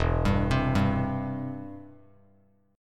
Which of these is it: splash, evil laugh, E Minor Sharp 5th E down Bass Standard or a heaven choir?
E Minor Sharp 5th E down Bass Standard